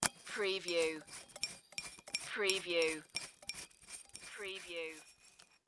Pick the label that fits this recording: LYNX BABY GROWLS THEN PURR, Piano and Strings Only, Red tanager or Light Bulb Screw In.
Light Bulb Screw In